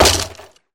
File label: Wood Damage, Wood Blocks; fowl